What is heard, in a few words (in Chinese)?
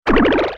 嘟噜噜，变化音